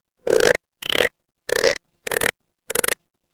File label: animal frog